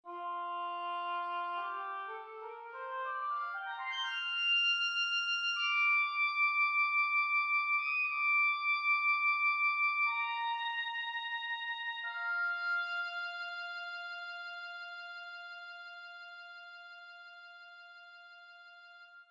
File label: Meditative; Juan Garcia Music